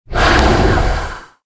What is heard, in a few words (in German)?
schaden; Barotraumatic; Shrimpbrut